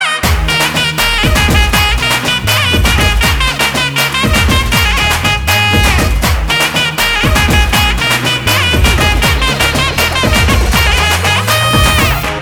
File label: drums beat